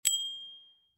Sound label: bell ring; tiny tink